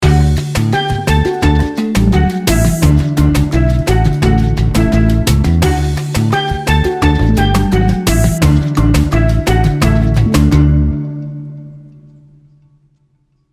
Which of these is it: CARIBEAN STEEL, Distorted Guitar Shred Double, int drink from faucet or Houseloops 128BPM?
CARIBEAN STEEL